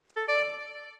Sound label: sky honk bird